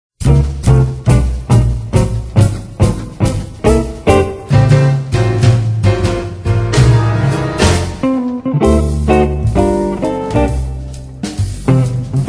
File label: Guitar